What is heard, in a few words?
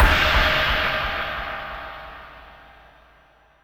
Crash Accent; Dance; SFX